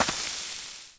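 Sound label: lava step; generic; ambient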